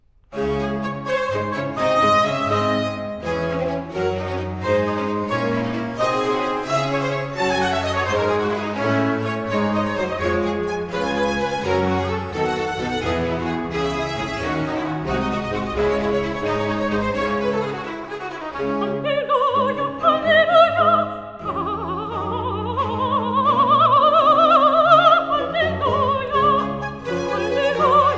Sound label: cantata for voice, 2 oboes & strings, Alleluja; Languet anima mea